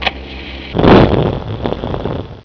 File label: Light Spell; Ultima III